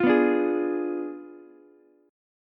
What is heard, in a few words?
G sharp Diminished 7th G sharp, Ukulele; G#dim7 strummed; JGuitar